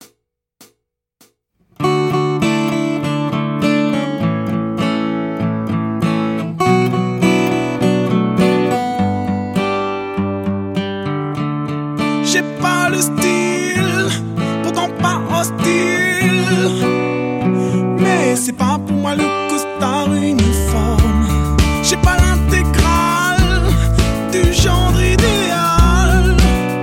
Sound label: guitar tutti